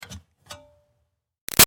Car Highlander Gas Door Open; 2005 Toyota Highlander V6; Vehicles; Big Room Sound

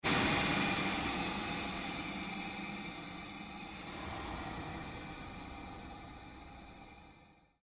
Created Pulse Fade, Rise And Dive Sound Effects; Sound effects created by manipulating other sounds; Rises and Dives

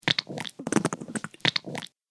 Squelchy Sound Sequence, slimy sound effect

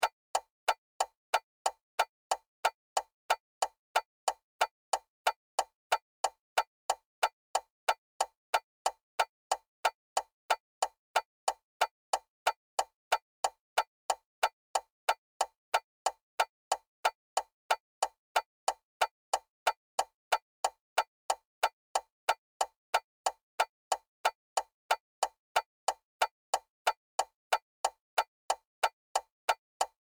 Auto Hazard Lights Blink Automobile Car; Vehicle; Cars; Vehicles

